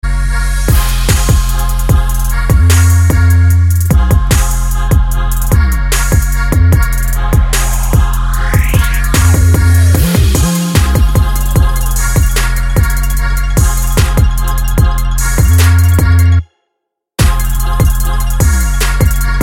Bass Boosted, Trap

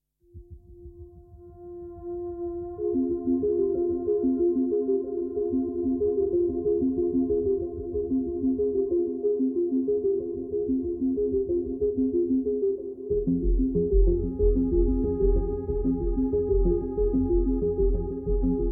Synth Version; Alternate

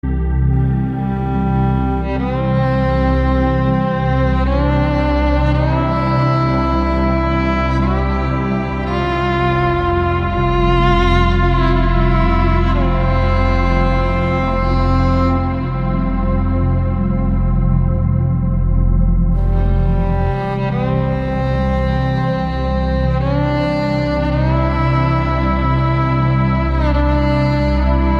Celestial

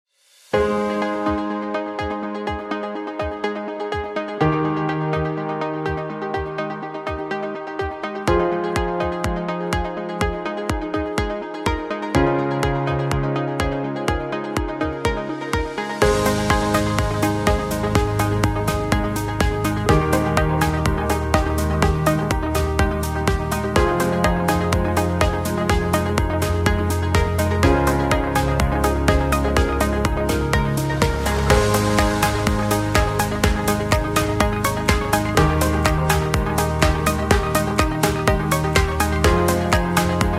Light Tech Corporate

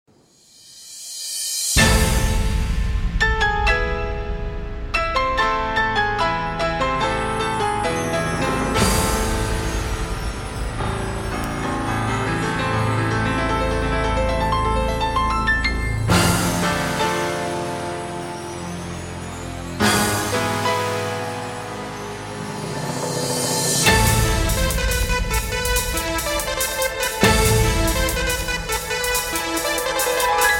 Piano Concerto in A Minor; Grieg's

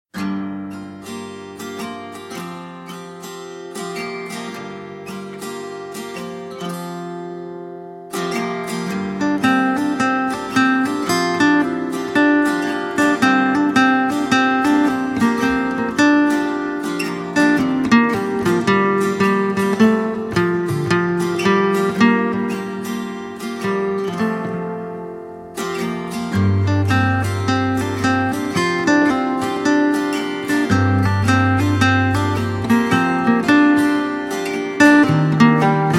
Instrumental Guitar

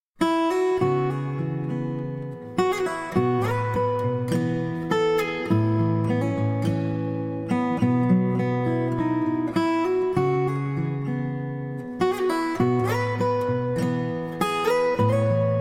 Acoustic Instrumental

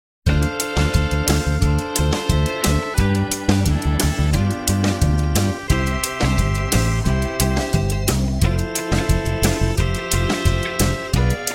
Reggae Road Trip; Cafe Atmosphere

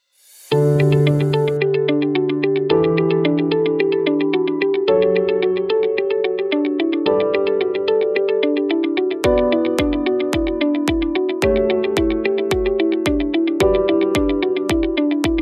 Corporate Style

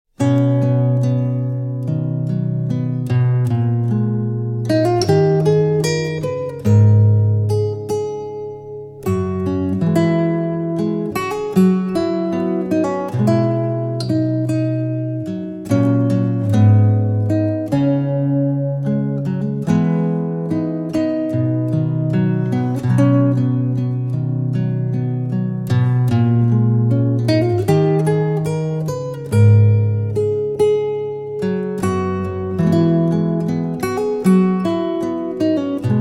Guitar Solo

